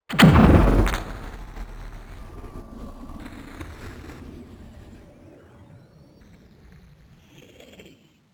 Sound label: Digital UFO Accelerate, Futuristic Vehicle; Sound Spark LLC; Hyperdrive- Futuristic Vehicle Elements